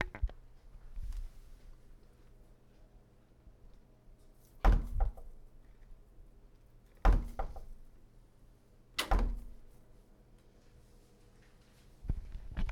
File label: Door Hits Wall